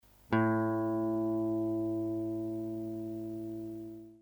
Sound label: gtr a, accordeur, guitare